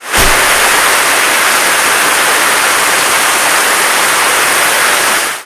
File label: cry